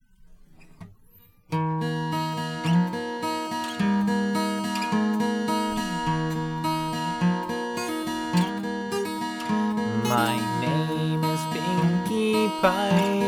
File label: (Acoustic Cover)